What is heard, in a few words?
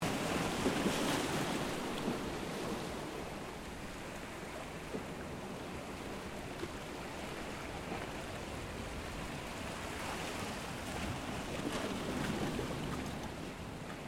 Water Waves Medium On Rocks; Ocean (Sound Effects:Nature)